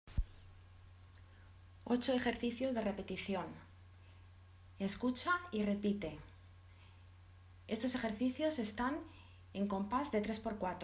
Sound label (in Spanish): impro ritmo